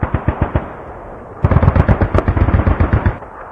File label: city battle, streetwar; ambient, levels